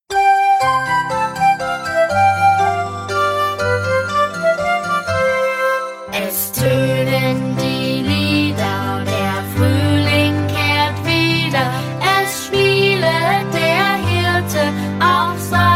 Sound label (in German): es toenen die Lieder langsamer